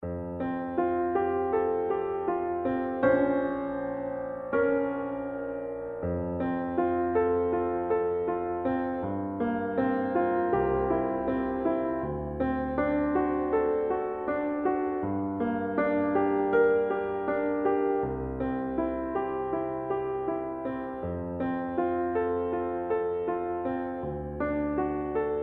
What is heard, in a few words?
accompaniment; pratley regina; cozy warm bed